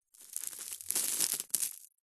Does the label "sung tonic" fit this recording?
No